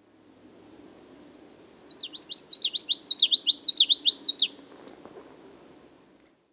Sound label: common yellowthroat